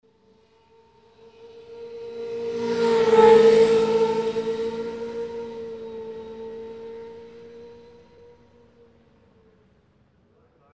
proezd gonochchnoj mashiny

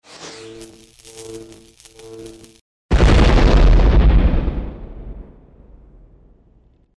vzryv vyzvannyj zamykaniem; Звук взрыва от бомбы или снаряда

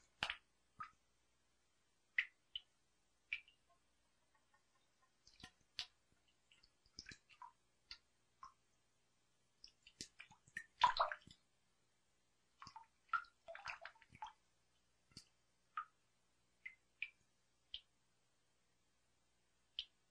kapaut kapli iz krana

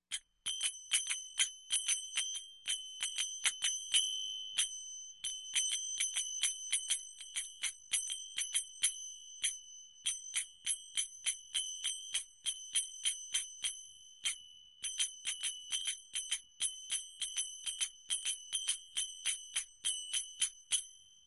Kolokolchik muzykalny; Колокольчик музыкальный; Любой звук колокольчика, корабельного, музыкального, маленького, большого, одиночные удары

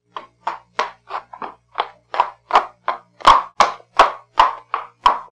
stuk kopyt po mostovoy

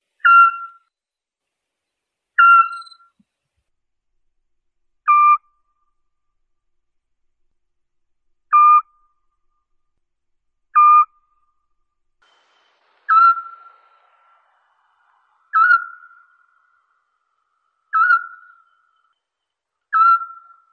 golos spljushki

